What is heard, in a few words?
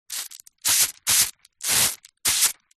furniture polish can spray; household